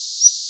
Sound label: wefl or song1; Passeriformes; Tyrannidae; Empidonax difficilis